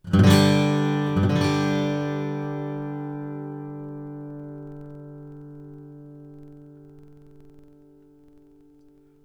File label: Herringbone Brazilian